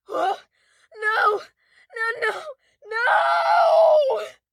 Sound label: town human far; Blind Drive